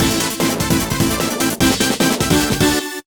Jingle Surprising; bomberman 2; nintendo ds

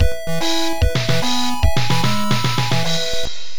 Bonus Game Win; magical crystals; arcade

